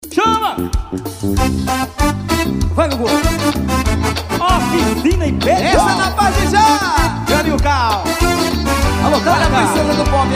explosao musical ao vivo; lata folia 2010

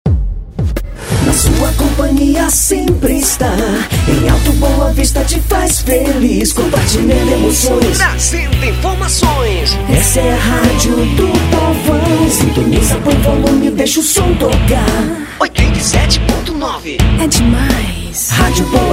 jingle FM; Boa Vista FM

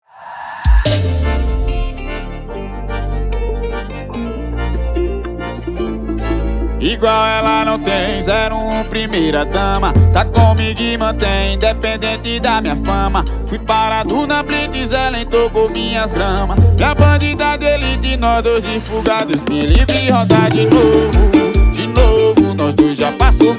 poesia acustica

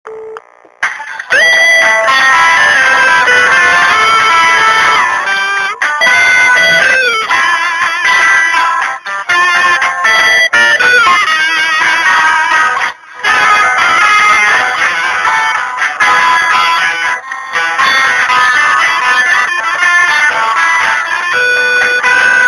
guitar gospel

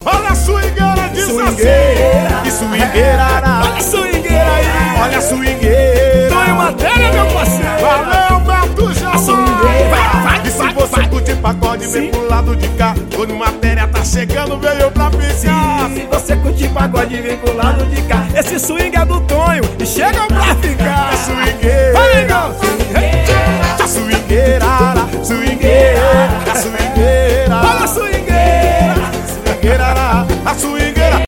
swingueira

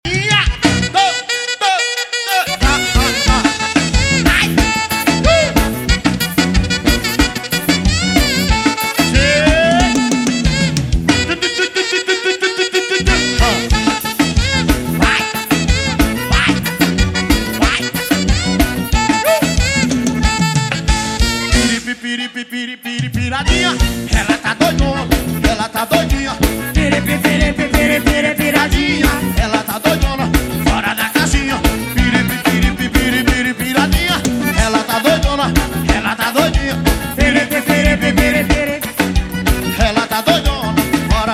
forro

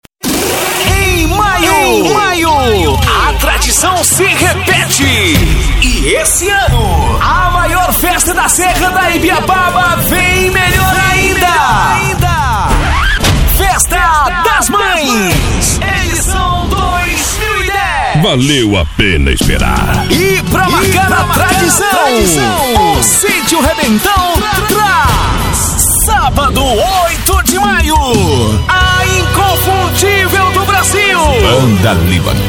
festa forro

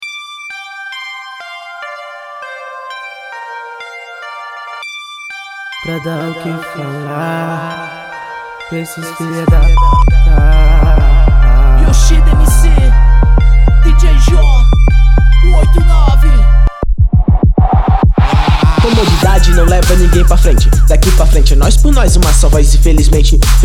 rap nacional

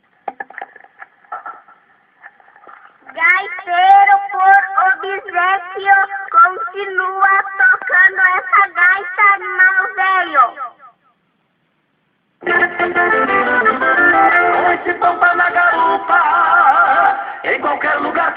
gauchesco ao vivo

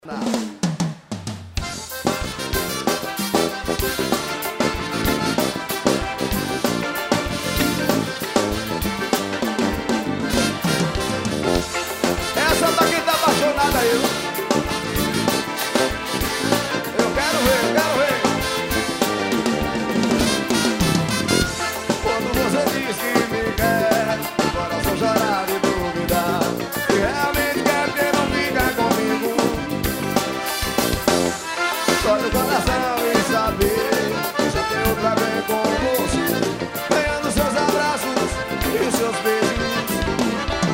banda ao vivo

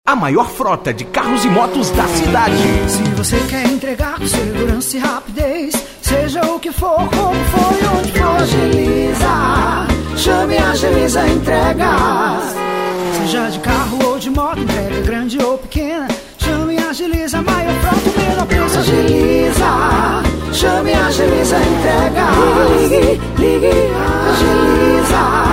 jingle agiliza entregas

